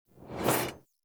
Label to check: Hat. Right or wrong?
Wrong